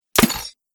Dull Blade Pierces Chainmail, sword; Sword Sound Effects

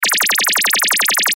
Sci Fi Scanner Beam Short; Scanner Beam Short Loop; Tech

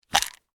Medicine Bottle Open Lid Small; Emergency; Pops; Objects

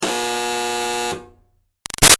Buzzer Door Junky Med; Breaking Up

